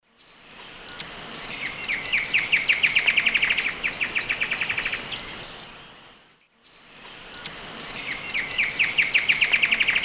Herpsilochmus dugandi, duet; S. America; Eastern Lowlands

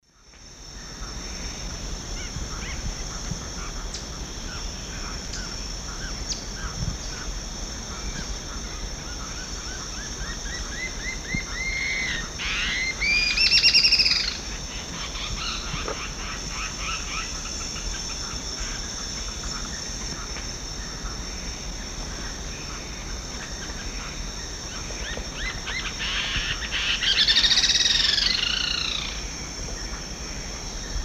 Galbula chalcothorax, songs 3 birds; S. America; Eastern Lowlands

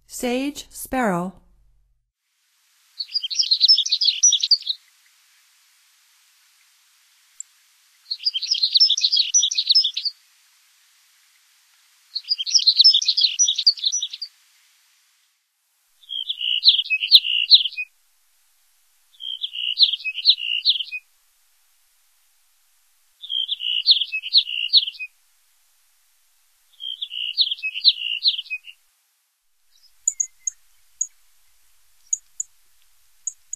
Sage Sparrow, Songs of Birds of North America; Stokes

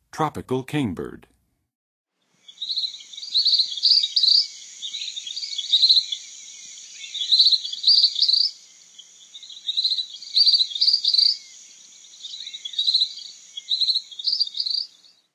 Tropical Kingbird, Songs of Birds; North America; Western USA; Western USA Songbirds

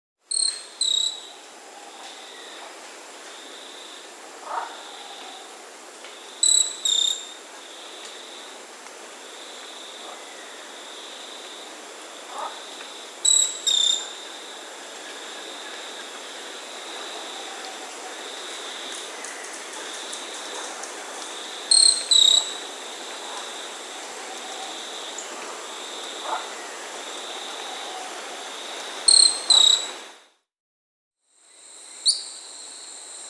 Short-tailed Pygmy-Tyrant, Myiornis, S. America

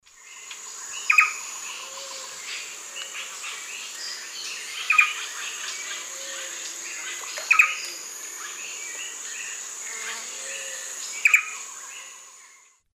Cantorchilus leucotis, calls; S. America; Eastern Lowlands

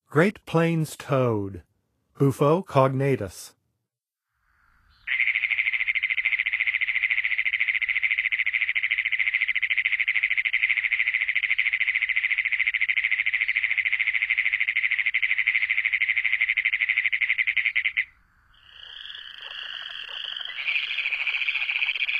Great Plains Toad; North America; Songs of Frogs & Toads of North America

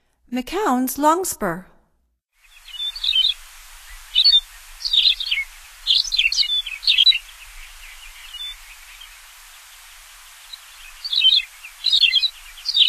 McCowns Longspur, Songs of Birds of North America; Stokes

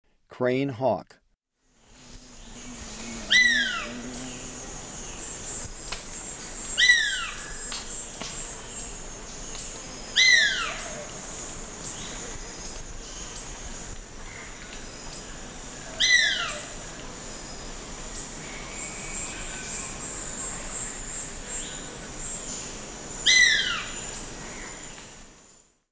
Crane Hawk, Birds of Ecuador; S. America

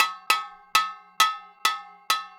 JUNKYARD RHYTHMS

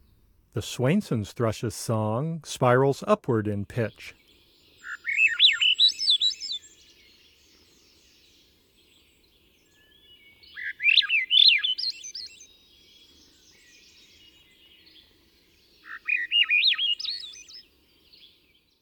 Swainsons Thrush; North America; Common Western Species; Know Your Bird Sounds

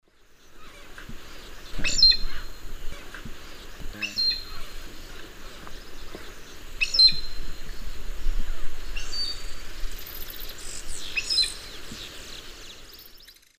Pteroglossus castanotis, calls; S. America; Eastern Lowlands

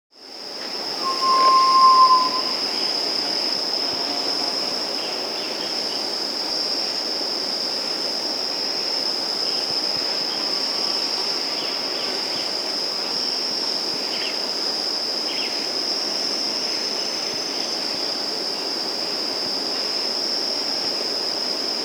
Black Tinamou (Tinamus Osgoodi), Andean Birds; S. America

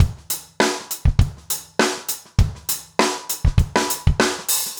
basic funk 100t

